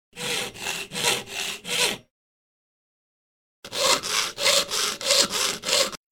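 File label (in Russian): пила тонкой щепы; dlya rezki zvuka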